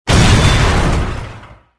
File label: garbage crush, movers, platforms